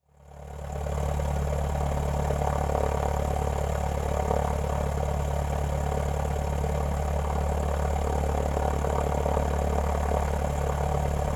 Vehicle Airplane Prop Plane Idle Cockpit Perspective; transportation